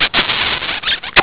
kiss

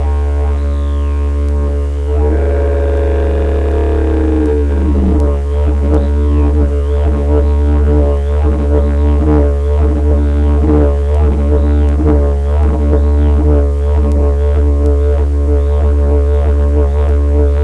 didgeridoo

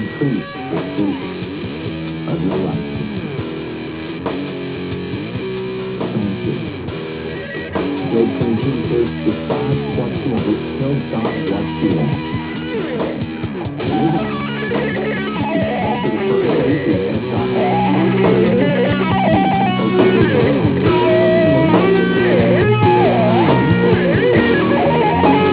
pigs, riffs; The inclusion of real pigs in the song

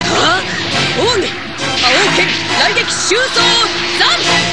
thunder

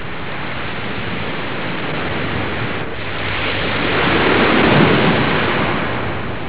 ocean

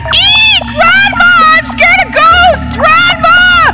Eek scare; Eek Ranma! I'm scared!

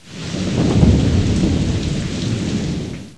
THNDR

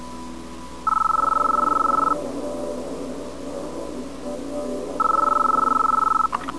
ring

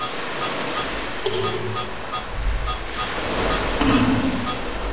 ocean drip

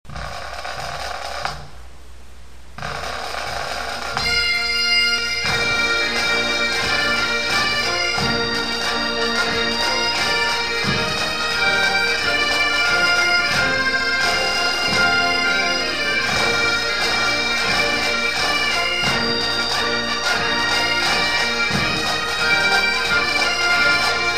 Bagpipes

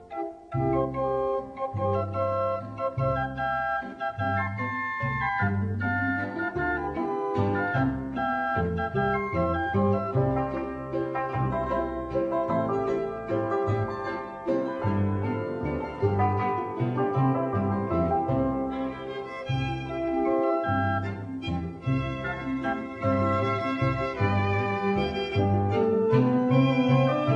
waltz

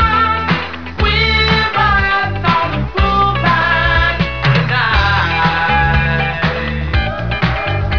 grooveline

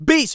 beatvox

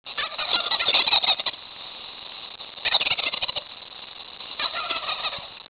turkey

